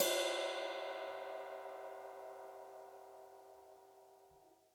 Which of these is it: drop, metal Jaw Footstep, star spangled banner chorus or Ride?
Ride